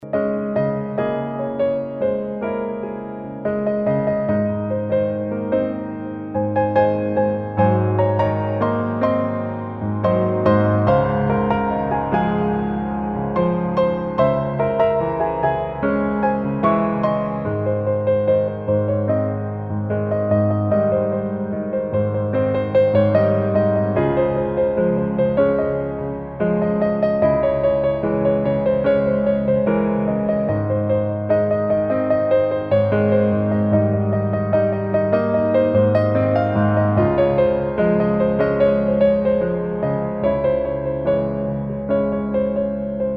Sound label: Mobile Ringtone